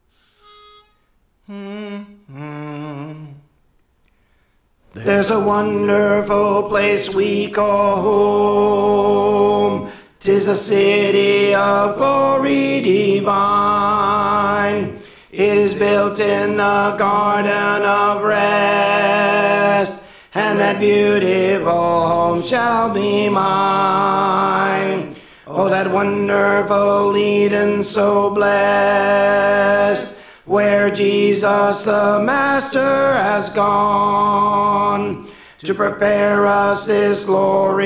Tenor; Praise For The Lord Training

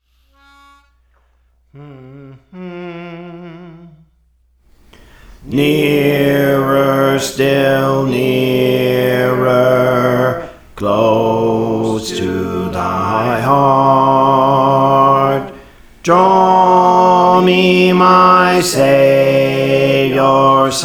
Bass, Songs; Praise For The Lord Training; Nearer Still Nearer

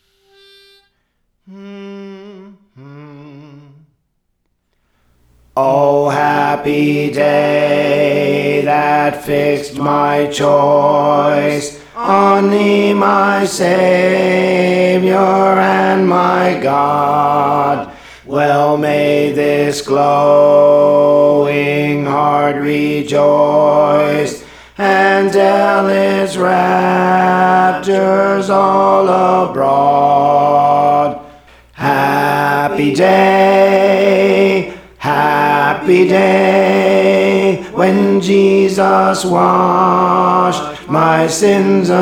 Alto, Songs; Praise For The Lord Training

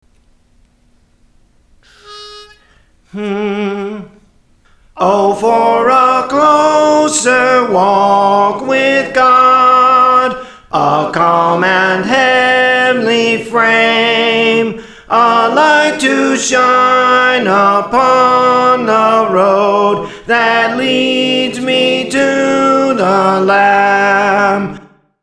Soprano; Praise For The Lord Training; O for a Closer Walk with God

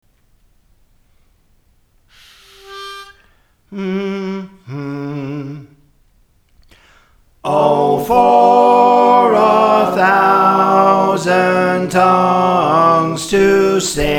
Soprano, Songs; Praise For The Lord Training; Belmont

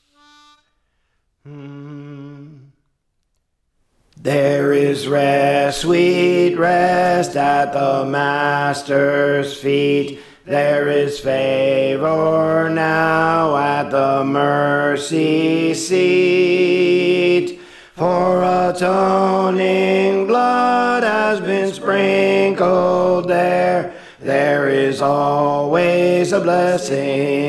Alto; There Is Rest Sweet Rest; Praise For The Lord Training

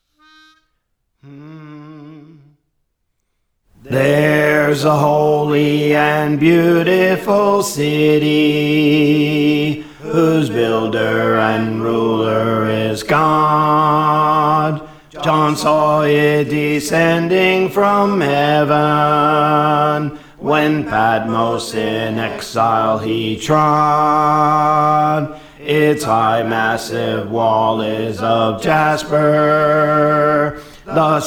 Bass; Theres a Holy and Beautiful City; Praise For The Lord Training

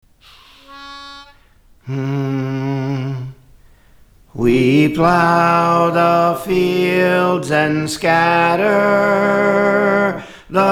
Soprano; Praise For The Lord Training

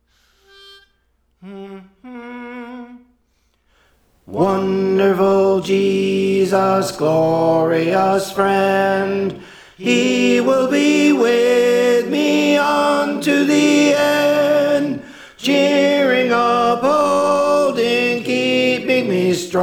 Tenor; Praise For The Lord Training

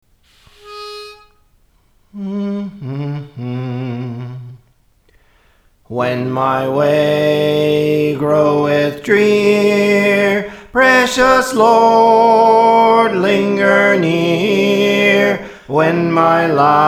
Soprano; Praise For The Lord Training

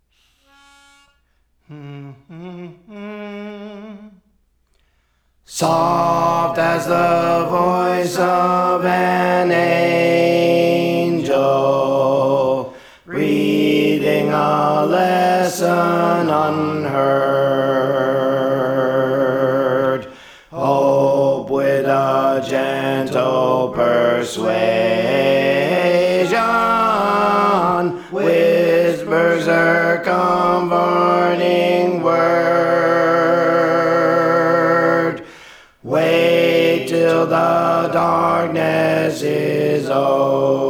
Alto; Praise For The Lord Training

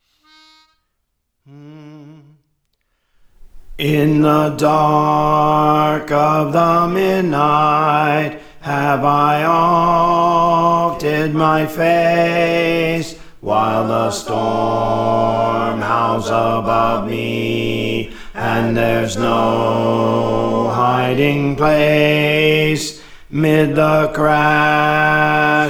Bass; Praise For The Lord Training

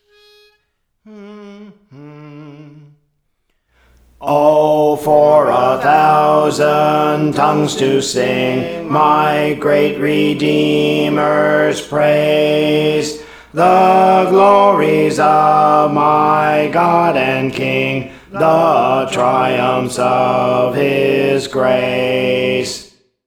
Alto, Songs; Praise For The Lord Training; Azmon

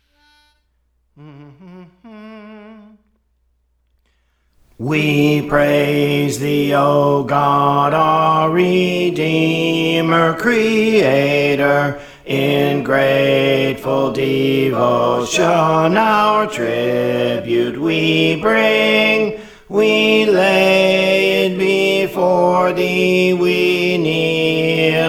Bass; Praise For The Lord Training

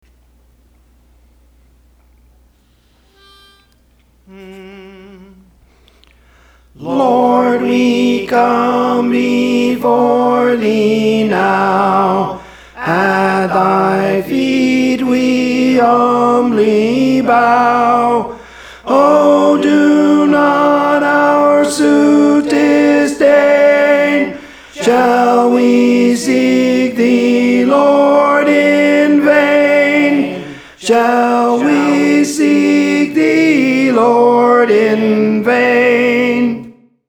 Tenor, Songs; Praise For The Lord Training; Lord We Come Before Thee Now

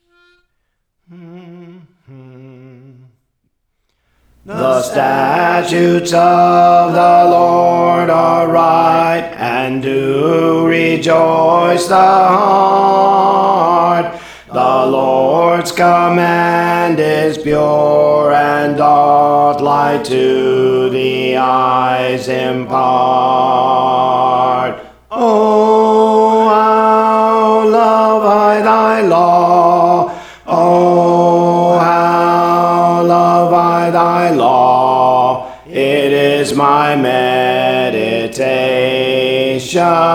Alto, Songs; Praise For The Lord Training

